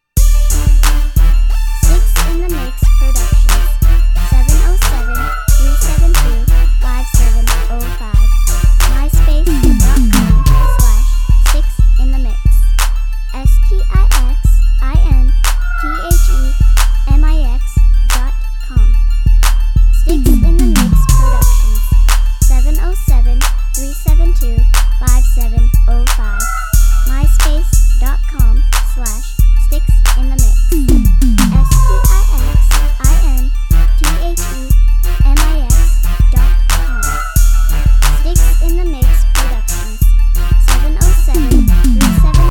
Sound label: crunk